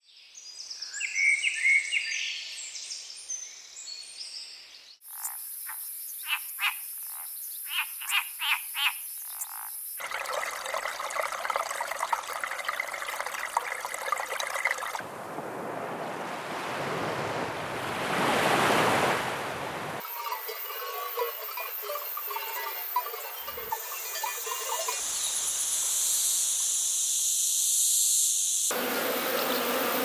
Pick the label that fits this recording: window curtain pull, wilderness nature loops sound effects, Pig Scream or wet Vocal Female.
wilderness nature loops sound effects